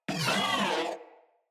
Moa Vocal Pain

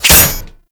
Radial Blast Impact Metal A; Excalibur

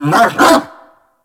Kubrow Tame Level Up Bark B; Warframe

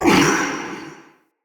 Mockery Male Vocal Pain; Enemy; Mockeries

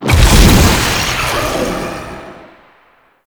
Cave Combat Shadow Despawn; Warframe; Duviri Murmur; Cave Combat Encounter

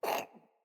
Kitten Cough Hairball; Enemies; Catbrow

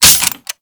Sniper Rifle Reload; Gorg

